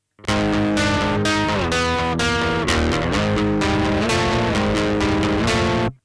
JCM900 Riff

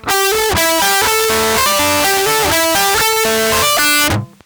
JCM900 Hagstrom Picking

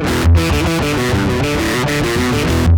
A15Mk2 Metal Motif; IDMT

